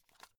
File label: watch case small open quick